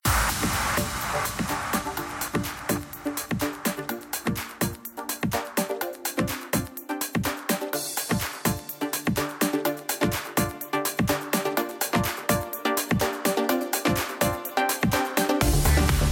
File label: ambient free games; Crazy Cars; wazdan